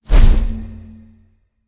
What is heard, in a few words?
activate; Smite; god Khepri